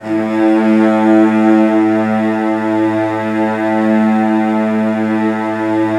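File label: CELLOS B2, Strings; ILIO; Synclavier Strings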